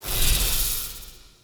Heal; Powers; Banneret

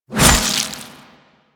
Hover Whizzby Envy; Enemies; Warframe; Warframes; Pax Duviricus

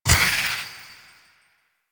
Fairy Soul Pickup; DE; Warframe

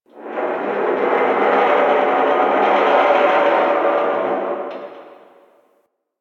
Asteroid Field Ship Metal Creak D; Warframe; Ambience; The War Within

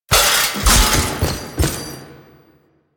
Riposte Nikana Finisher Foley; Enemies; Finishers; Dax; Warframe; Duviri

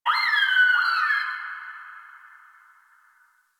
Drifter Camp Bird; Ambience; One Offs; Birds; Warframe; Quests; The New War

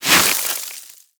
Sword Elemental Ice Whoosh; Enemies; Weapons; Warframe; Teshin

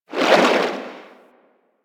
Shark Tail Move Swim

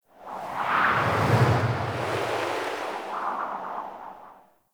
Medium Wind Gust; Wind Gusts

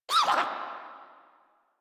Pain; Grineer Jester

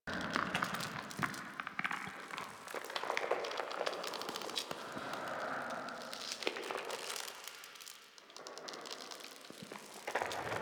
Exterior Covered Tendon Area Loop, Ambience; Warframe; Infested Eris; Amb Loops Exterior

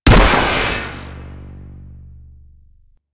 Electro Mag Charged Shot, Weapons; Warframe; Corpus

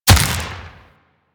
Deathshot Default, Bullet Impacts; Warframe; Weapons

